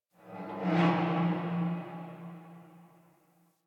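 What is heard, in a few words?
Distant Metal Garbage Wronk; Warframe; Nineteen Ninety Nine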